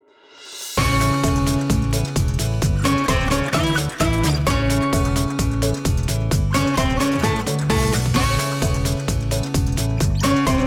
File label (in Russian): Детские Песенки; Маша и медведь